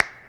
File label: CLAPS HIP; Best Service Dance Mega Drums